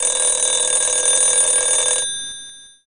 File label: telephone ring; warbucks